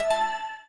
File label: confirmation note; Nokia; 770 Internet Tablet